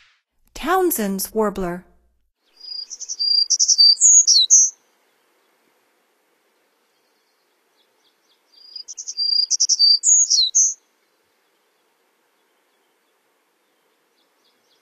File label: Townsends Warbler, Songs of Birds of North America; Stokes